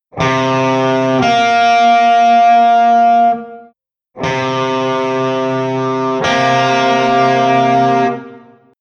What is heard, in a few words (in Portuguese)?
Intervalo de Setima Menor